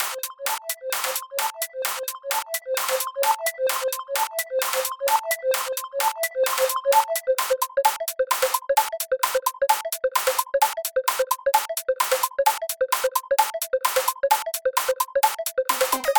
Rapid Loop Constructed; Parawave

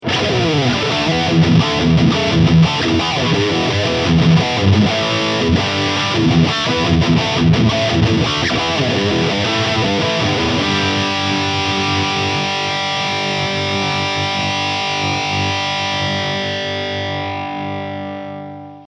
Blackstar Dept. 10 Dual Distortion OD1 Amp

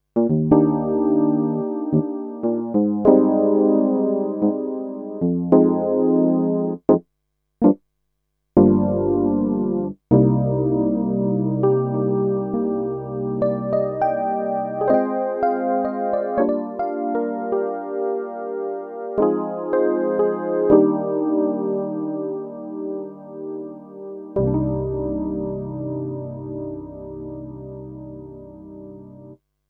Alesis Concert Electric Piano

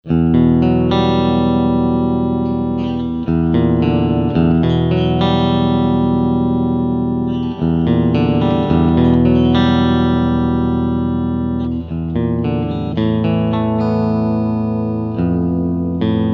Picking Singlecoil

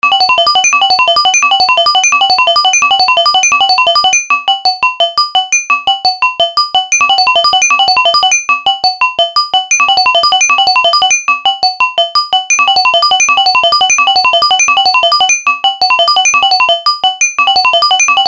Analogue Solutions EKG Half Tempo

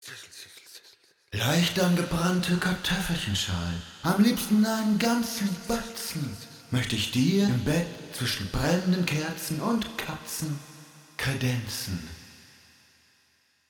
Eventide SP2016 Plate Modern; OpaJott; 60per

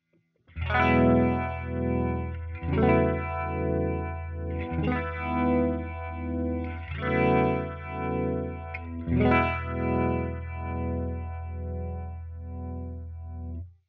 Rotary

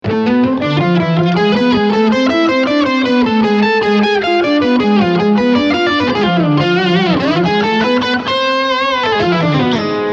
Acoustic Distortion; Overton

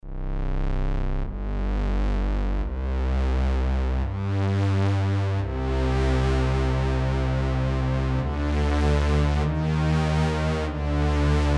ROLAND FANTOM JUPITER-8 Model Expansion

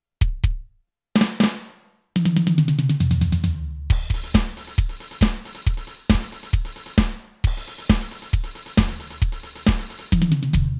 Millenium MPS 750 Metal Kit

